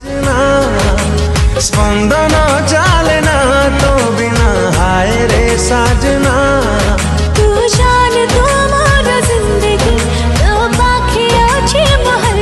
Sad Song

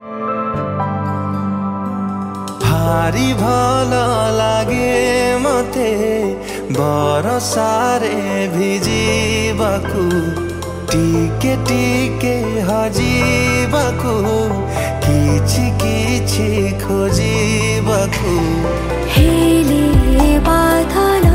Song Odia

